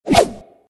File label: Char Slash